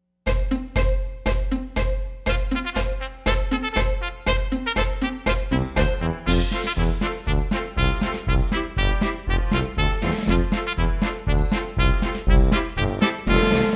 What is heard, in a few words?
German Band Stop and Go Instrumental